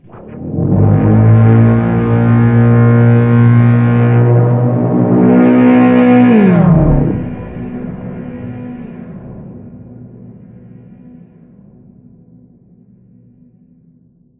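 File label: cables pulse layer left; Half Life Alyx; music; vault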